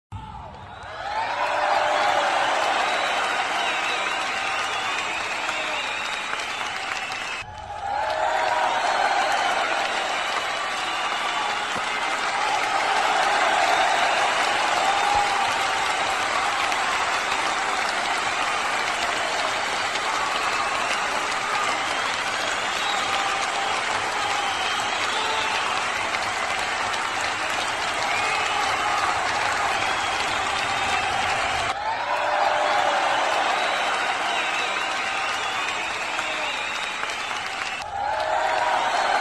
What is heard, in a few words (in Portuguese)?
Aplausos